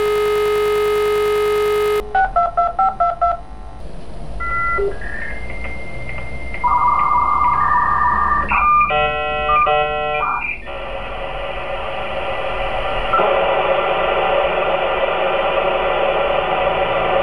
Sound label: ringtone